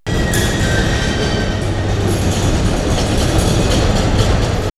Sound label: Torture Chamber; X-Static Goldmine